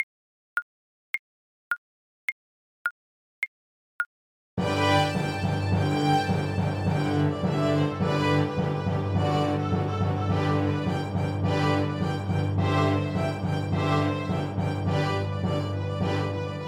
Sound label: Capriccio Espagnol FL kar, Flute